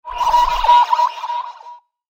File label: Ufo Scanning Artifact; Spacecraft Sound Effects; science fiction sound effect